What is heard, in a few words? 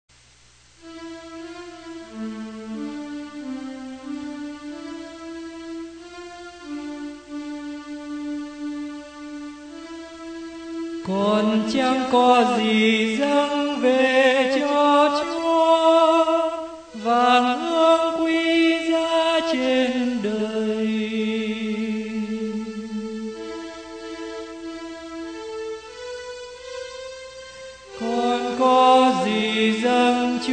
alto